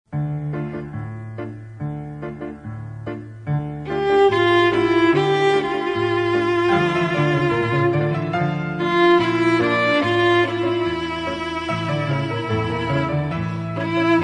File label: Mobile Ringtone